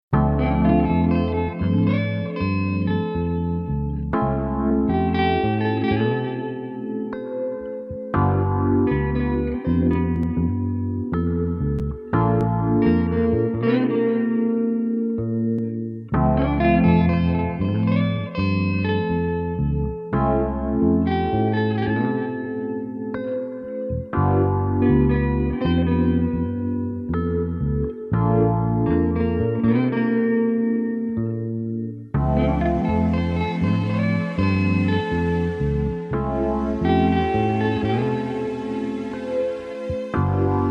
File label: 432 hz guitar chill